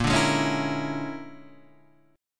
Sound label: A sharp 7th Sharp 9th Flat 5th A sharp; A#7#9b5 Chord {6 5 6 6 5 6} strummed